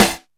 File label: Snare, Alesis DM5